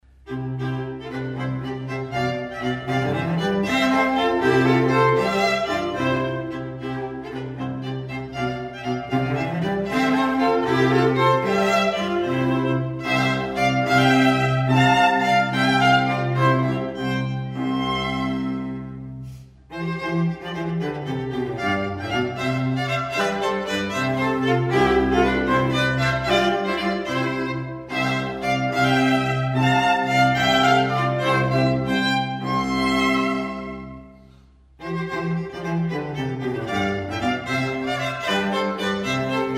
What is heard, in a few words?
trio; koczwara